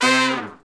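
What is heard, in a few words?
BRS SHRT FA, brass section falls; Roland L-CDX-03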